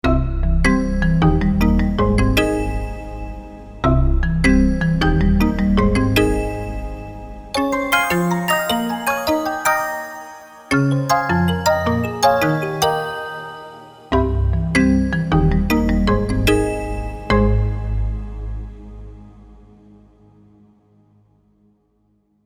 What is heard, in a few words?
african dream; fantom-g